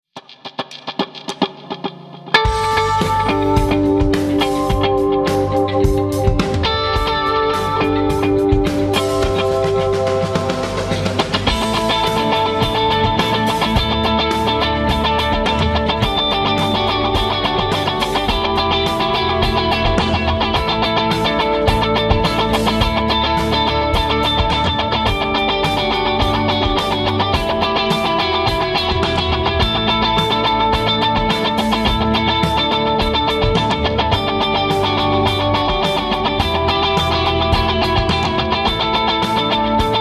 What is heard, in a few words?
Axe FX